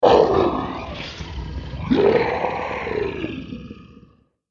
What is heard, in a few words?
Dragon Rough Day, monster; horror